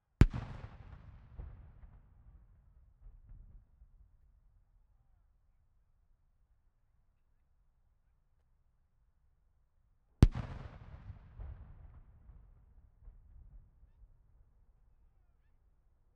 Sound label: bang